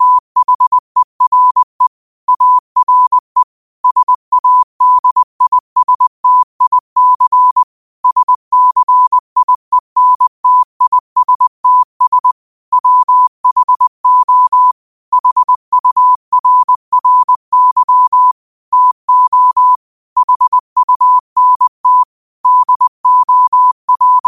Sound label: 5 wpm, morse